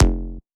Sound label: Psy Bass